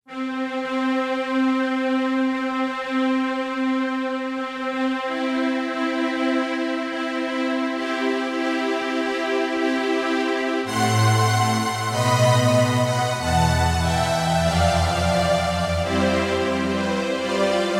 Wedding March Mendelssohn strings; Midsummer Nights Dream; Laura Cummings

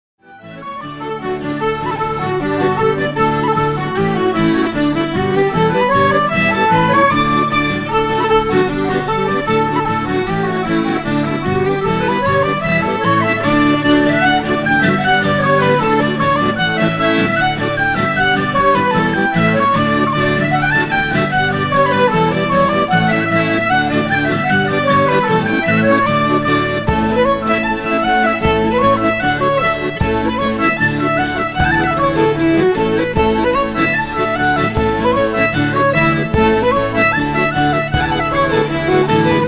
Polkas, Celtic

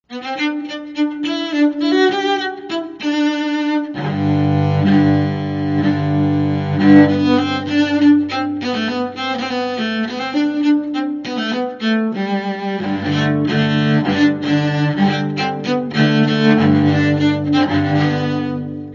fast, Cello; Bazelaire

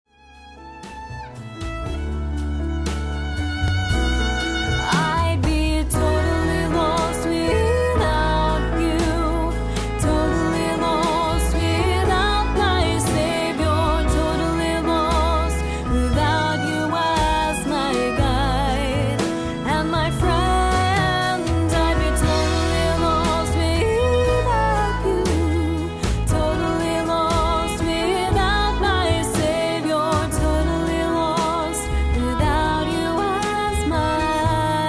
voice Totally Lost

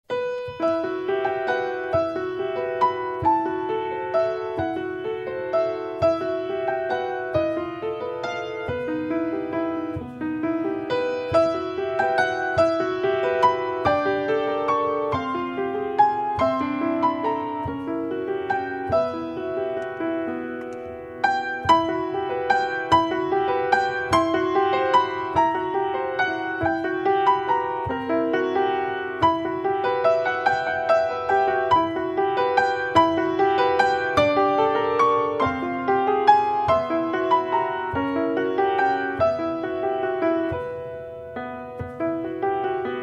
piano

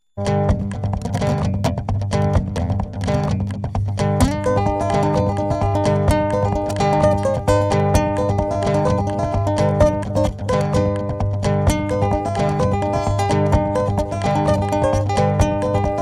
Improvin G, Solo Fingerstyle Guitarist; Acoustic Guitar

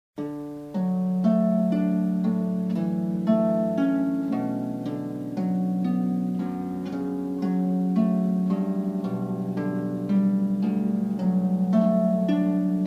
Ceremony Music, Canon in D, harp

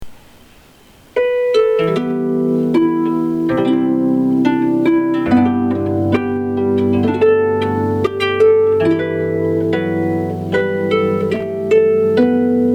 Harp

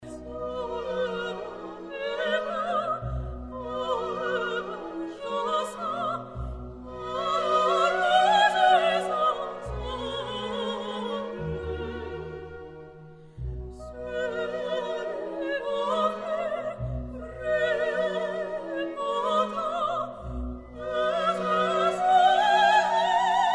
Flower Duet; Classical; La Belle Voix New York; Delibes

